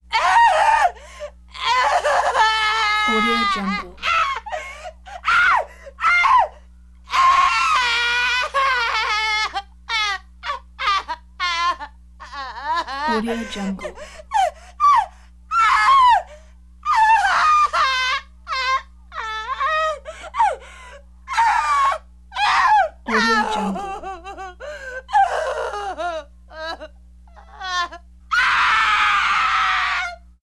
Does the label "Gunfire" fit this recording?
No